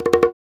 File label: Congas, African Contemporary